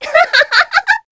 succubus laugh spin; queen girl

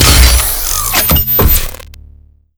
gauss sniper fire; doom gauss

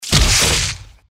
gecko death; npc

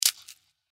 mantis claw; npc

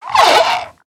pain, zomb female; nmr

